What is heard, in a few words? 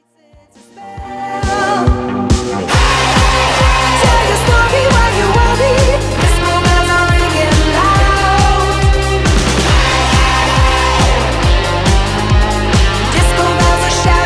Disco Bells